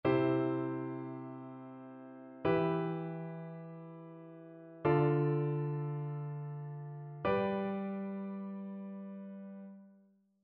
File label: harmonic dictation; aural skills i